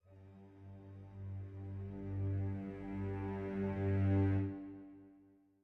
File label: music interactive; World of Tanks